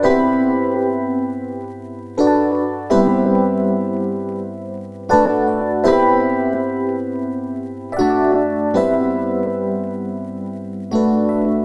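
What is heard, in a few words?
Electric Piano; Keys; Keyboards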